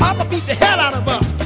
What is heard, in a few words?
beat